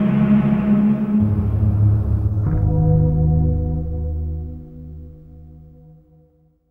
Bell Tolls, Gong Cycles; Sonic Foundry (Sony Creative Software) - Friendly Fire; Gongs

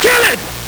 LSDj, Nintendo Gameboy; 300 Drum Machines

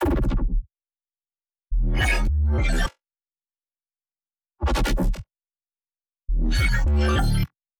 Bass Loop BPM105 D

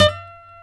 F#5 hammer nylon, guitars; HAMRNYL

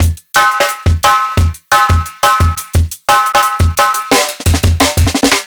Live Jungle Drum Break, 175; JungleBreaks24

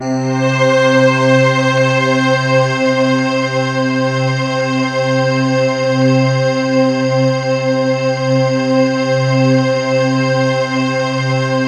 STRINGED; MORPHEUS

